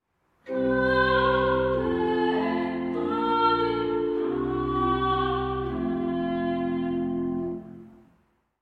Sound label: Amen